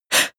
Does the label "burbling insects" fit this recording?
No